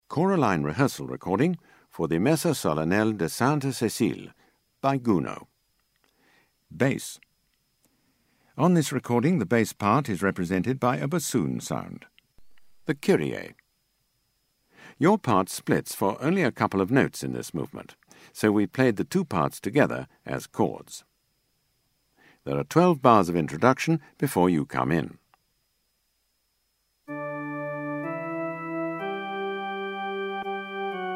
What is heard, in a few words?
Bass; Gounod MS; ChoraLine - Rehearsal CD & EasyPlay